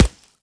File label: sand, bullet impacts; physics; Half Life Model Pack